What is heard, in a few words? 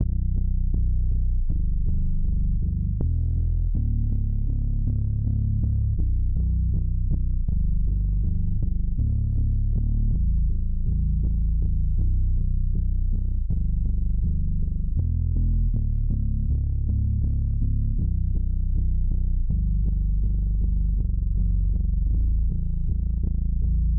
sub bass; shoegaze